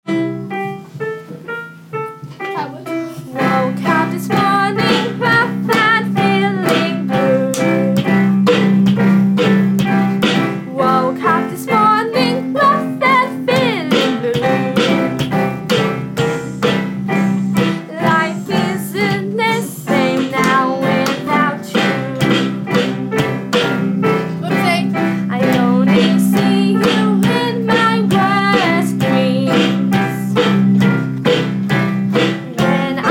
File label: jazz piece; jazz final piece